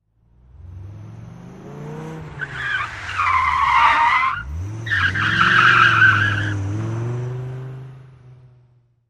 Accelerate Quickly, sports car

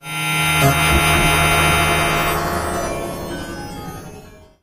Computer Scan Modulat, stinger

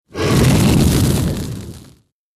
Fire Breath Dragon

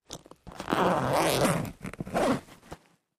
Bag Zipper Open; zippers

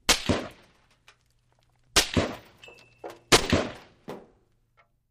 M-16: Single Shots With Impacts And Debris

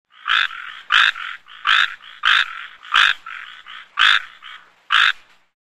Frogs Tree Croaking

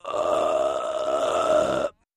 Med Forced Belch

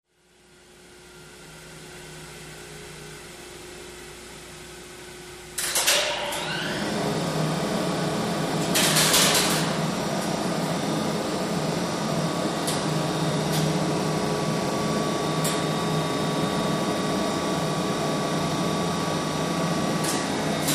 Freight Elevator

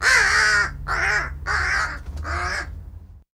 Crow Caw Bizarre

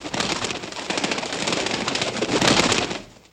Bird Wing Flaps; Single Bird Wing Flaps.

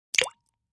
Splash Sml Plop Drink

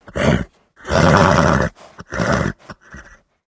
Horse Vocal Faster R, Horse Vocal, Very Excited; milling vocals

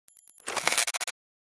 Detonator, timers

